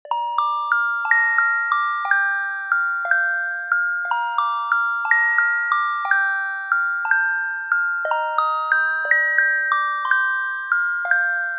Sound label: Music Box Medley, mono synth; reaper